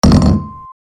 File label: big ball